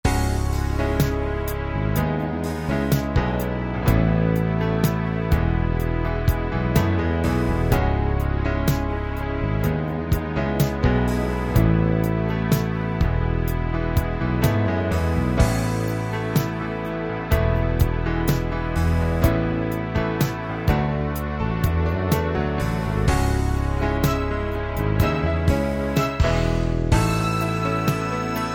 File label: ballad